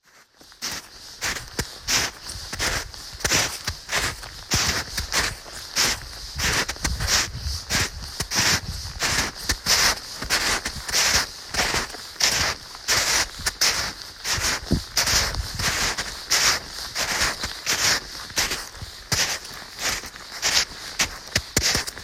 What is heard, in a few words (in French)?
marcher dans la neige, gavarnie